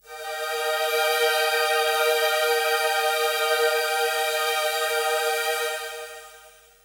Pad E2; Techno; Melody; TechnoMusicMaker

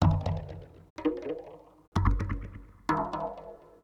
PERC LOOP ETHNO 125; eJay

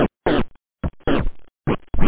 transit, scratch; da capo vol1; instruments

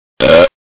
BURP, HUMAN; 11,025 sample rate

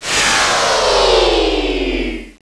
Wave Over; Swoop 1.0.2; Swoop Sounds

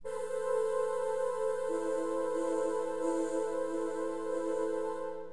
VOX PAD, HipHop, Keyboards; LiveAct Pool 1

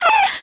drop; balloonrain

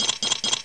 Ratchet Bolts

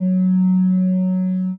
string

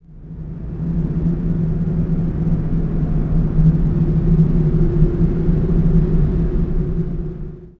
WIND low; thief

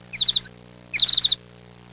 fakebird; Sound Effects for Multimedia - Volume 1; birds

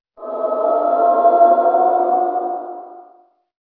sanctuary, Ambient; Revista CD Expert 45; ZEUS

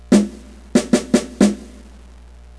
drum march; Eagle Strike v1.01

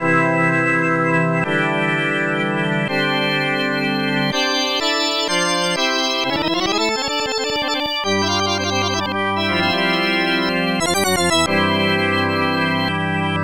ORG JAZZ

